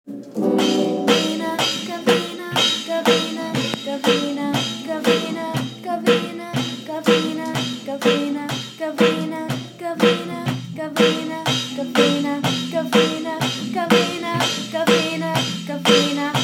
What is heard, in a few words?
drums